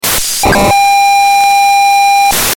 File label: FLEX-C Paging; Motorola's advanced, high-speed paging standard (1600, 3200, 6400 bps)